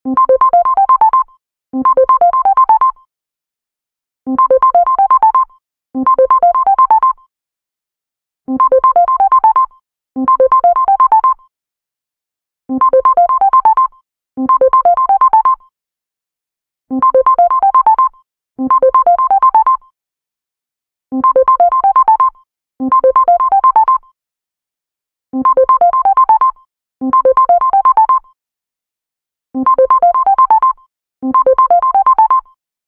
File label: Simple Bell; LG; Спокойные И Тихие Рингтоны; Рингтоны Без Слов; Рингтоны 2016; Зарубежные Рингтоны